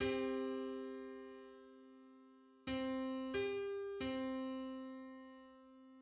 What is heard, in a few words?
Seven-hundred-seventy-ninth harmonic on C